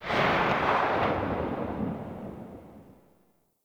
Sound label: Hand Shotgun Tail, weapons; Grineer